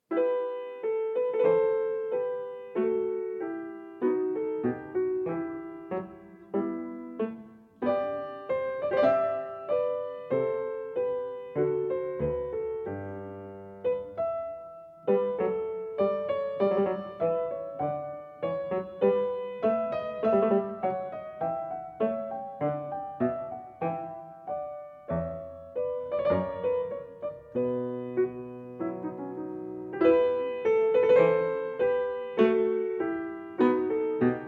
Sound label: Sarabande; English Suite No. 5 in E minor; BWV 810; BACH; ENGLISH SUITES